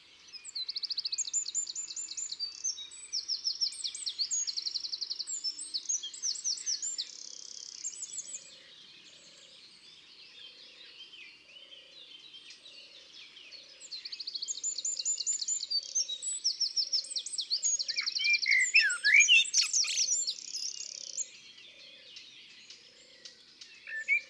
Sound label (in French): Troglodyte mignon Chants d'oiseaux des bois et des forets